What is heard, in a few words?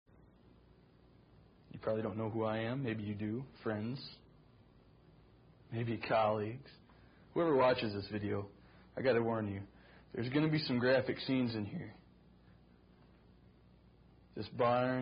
Drinking Game; Are You A Cardinal Drinking Game